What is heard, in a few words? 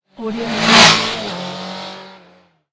motorcycle pass by